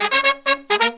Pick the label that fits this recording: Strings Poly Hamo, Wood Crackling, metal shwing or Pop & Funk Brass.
Pop & Funk Brass